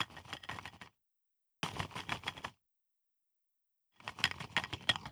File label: Cookie Jar Rummage; Weird